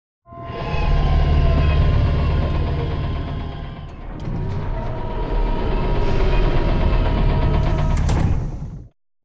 Sideways Town Giant Floor Panel Arrive Rotate Lock Servo; Boomtown; Titanfall 2